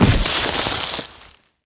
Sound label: branch fall; firefight